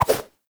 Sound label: Run Whoosh, Foley, Footsteps; Enemies; Warframe; Lasrian Soldiers; Lasrian Butcher